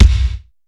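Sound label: kick; Hollywood Edge; The Big Bad Beatmachine; PROCUSSION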